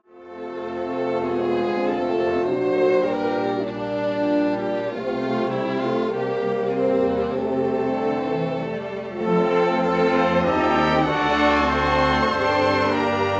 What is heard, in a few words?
christmas music for orchestra